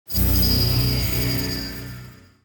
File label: use magic